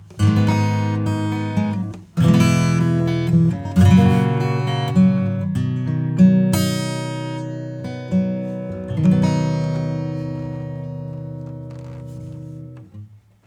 acoustic Acoustic Guitar; Oxygen

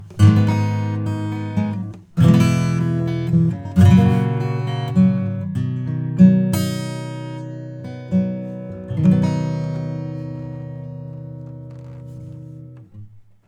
Transient Shaper Attack Sustain Smooth; Neutron 4; Attack 6; Sustain 2

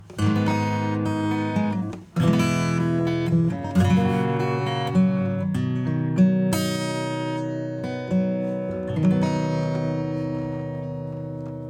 acoustic; ADC1 Compressor; Acoustic Love

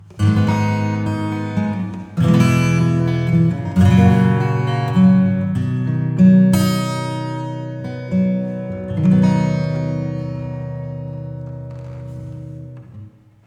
Reverb Acoustic; Breeze

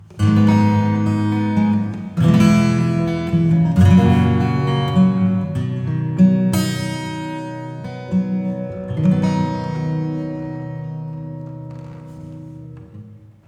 Reverb Acoustic

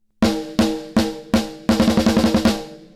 Snare

